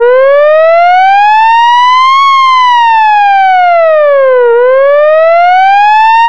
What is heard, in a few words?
short alert electronic siren